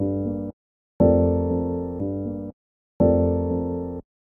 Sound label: piano first beat